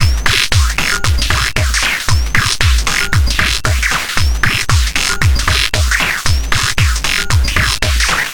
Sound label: 115 Bpm Stab Loop